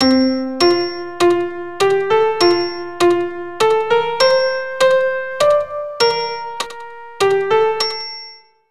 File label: Lead in notes, keyboard